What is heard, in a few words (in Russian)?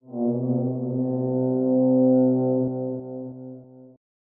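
труба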